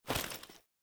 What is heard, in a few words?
Female Foley Action Default Melee Swipe, Female Default Clothing; Characters; Player